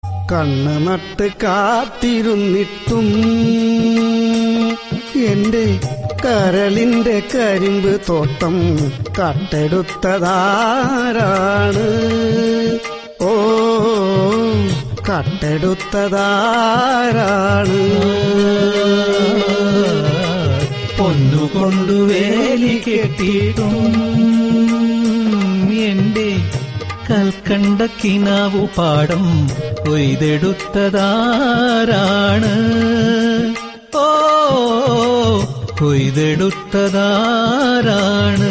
love failure song

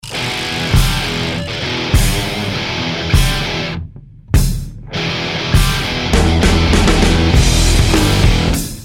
rock; fast and furious

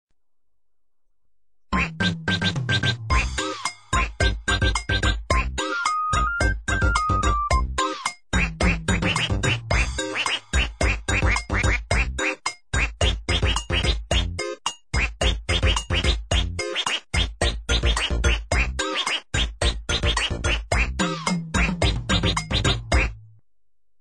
duck thai dance

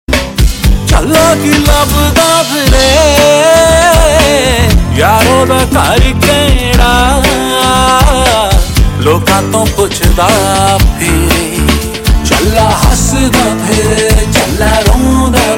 flute; srk

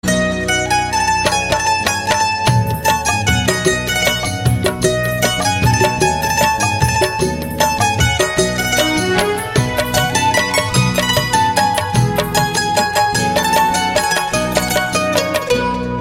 sweet romantic tone

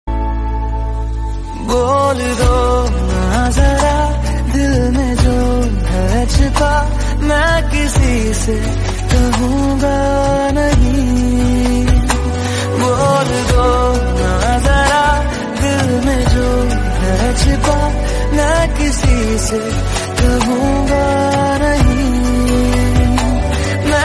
song

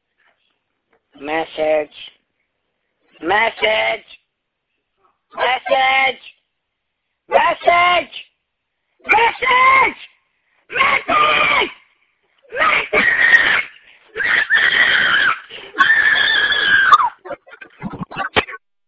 message

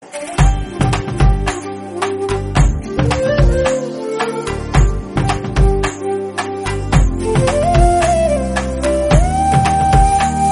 o baby fluet

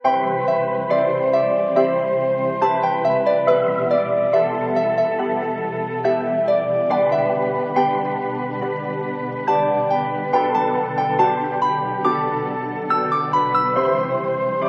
romantic

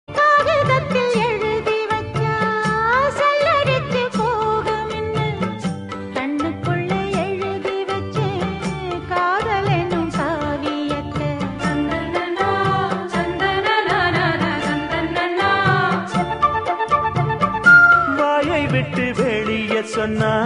tone

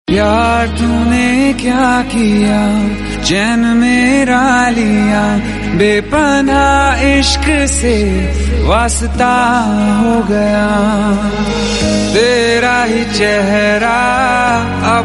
song ringtone

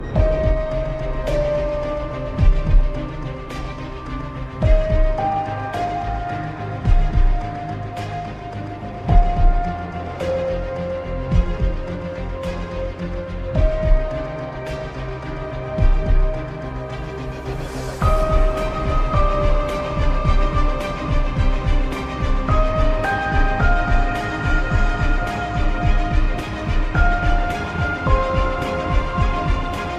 theme song ringtone; daredevil

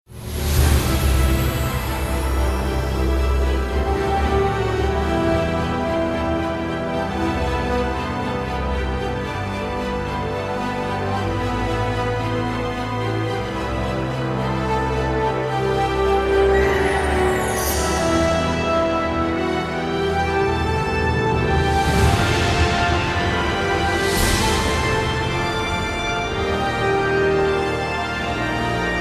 music

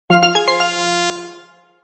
notification

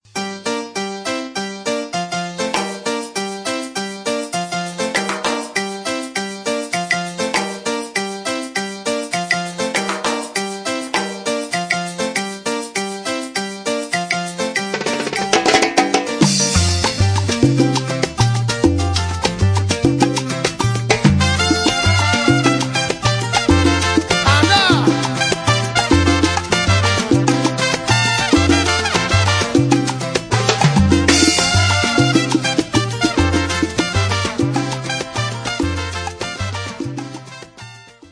romantic

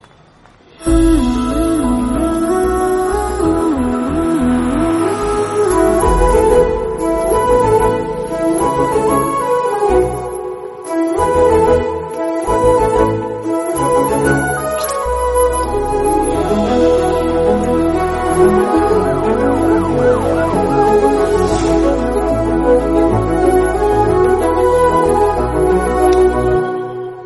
background music romantic; georgettan s pooram

